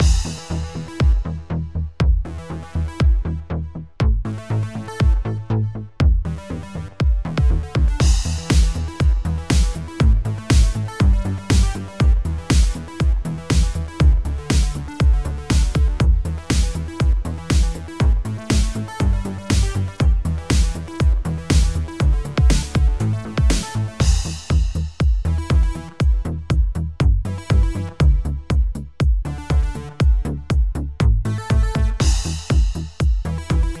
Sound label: Mellow Mix Stem Loop Layer 2